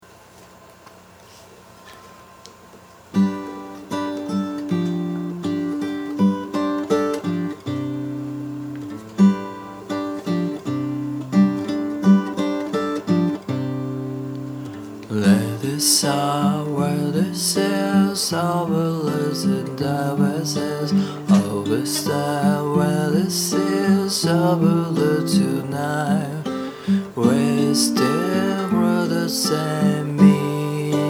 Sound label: guitar guitar; tonica